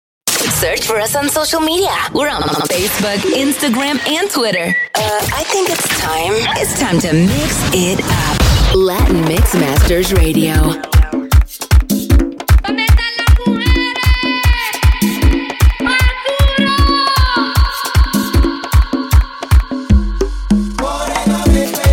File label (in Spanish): MERENGUE Mambo MIX